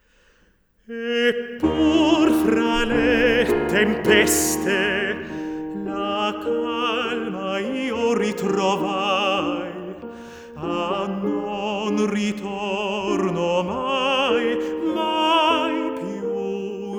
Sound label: Mehrstimmige italienische Gesange; ''E pur fra le tempeste''; Der Glorreiche Augenblick Op.136; WoO99